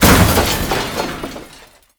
Throne Debris Impact; Roathe; Warframe; Tau

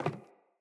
Sandals Run Wood, Footsteps; Player

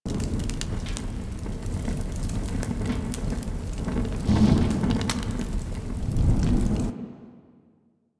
Car Fire Loop; Ambience; Props; Warframe; Nineteen Ninety Nine

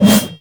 Heavy Melee Whoosh; Enemies; Warframe; Grineer Nightwatch; Grineer Fortress Heavy Melee

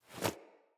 Blacksmith Hammer Strike Foley; Blacksmithing; Friendly; Tuvalkane

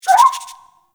Rabbit Ambient Call; Warframe; Duviri

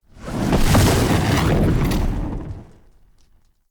Damage Field Cast

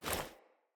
Female Foley Action Default Melee Swipe; Player; Female Default Clothing

